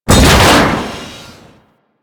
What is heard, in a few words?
Storage Container Cylinder Smash; Objects; Warframe